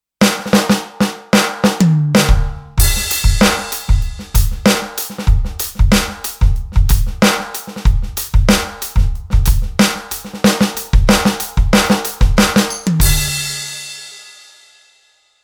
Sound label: Set BluesRock